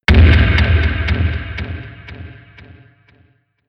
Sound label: low drum; whooshes rises